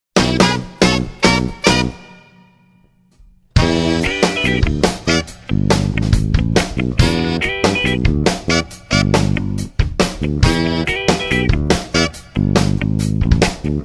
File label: Soul Funk; Soundzabound Volume 5 Disc 3